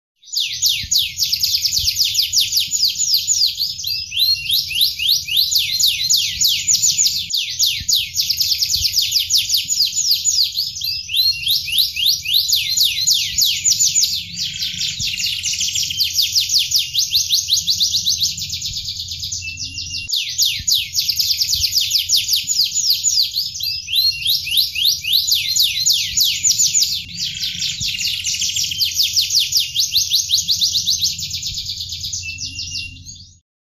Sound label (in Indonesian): audio kenari nagasari modifikasi jeda 1 menit